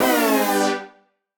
Poly Chord Hits, Ramp Down, future rave

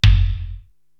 TOM Lo Ambi, Korg TR-Rack

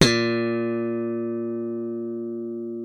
A#2, slap thumb; Xsample VOL-1

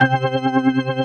ORGAN, 135 BPM; Drum & Bass; Ueberschall; Drum & Bass Flash Trackz

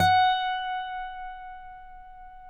Finger F#5 R, Guitars; Spectrasonics; Hans Zimmer Guitars VOL-1

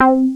raver C5, raver bass; Best Service Dream Experience

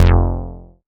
bass F1, Roland MKS-80

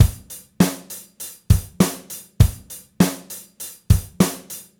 Gretsch groove 90 BPM, 1967 Gretsch Kit drums

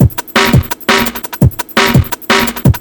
Juno Beat

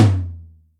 Dry Lo, Acoustic Tom

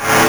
Fade In, 7999Hz; Sonic 3; M8; Genesis

